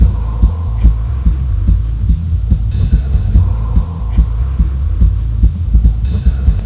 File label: nightclub, ambience